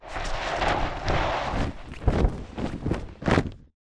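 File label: flyer perched take off medium distance; boneyard; Titanfall 2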